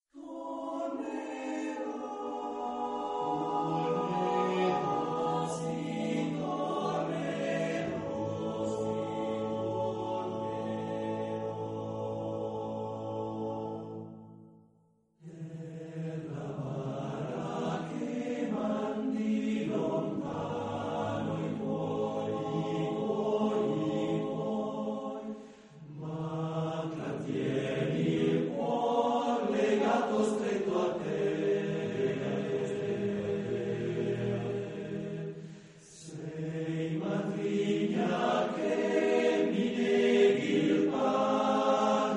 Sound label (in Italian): Canto dell emigrante trentino